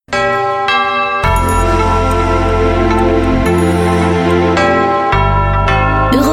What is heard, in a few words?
carillon; radio; europe1